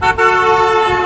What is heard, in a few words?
Doppler Effect